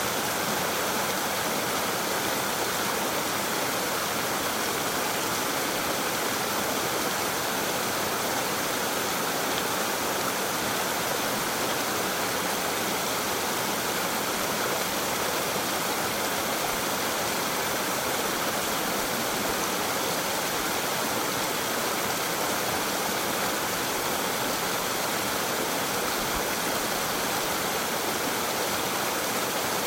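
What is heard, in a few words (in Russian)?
весенний грохот водопада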